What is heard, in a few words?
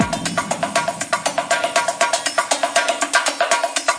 gated conga break, 120 bpm